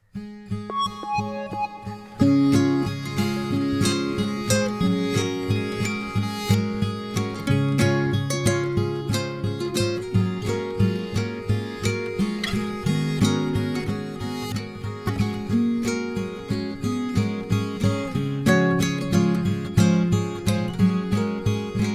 Electro Acoustic Pop

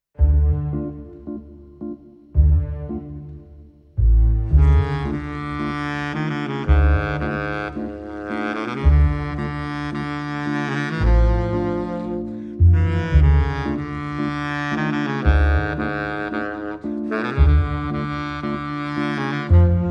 Submix no Celesta

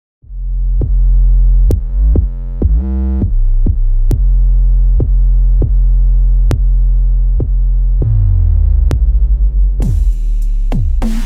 electro Bass; OF FATHERS AND SONS – Soundtrack

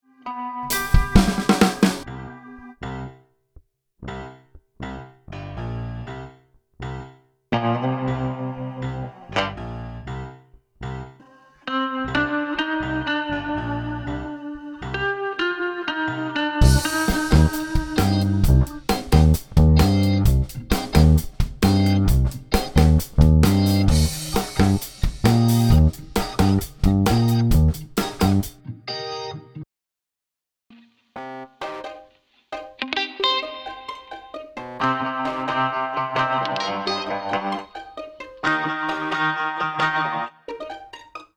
60s

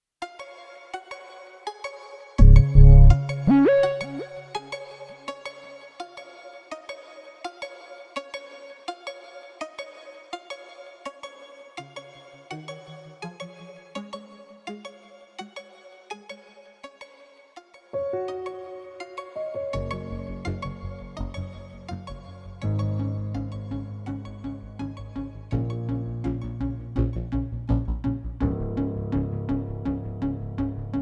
Electro Pop

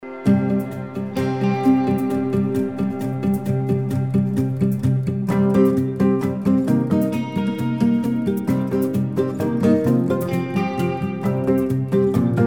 Gt Groove

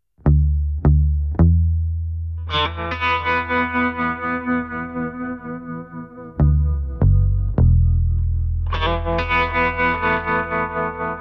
dezent The Bass The Guitar

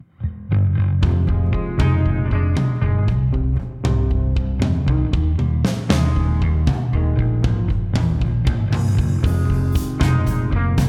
Emo Guitars Bass Drums; OF FATHERS AND SONS – Soundtrack

